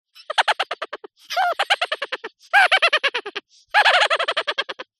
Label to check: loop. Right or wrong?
Wrong